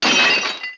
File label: Pot Shatter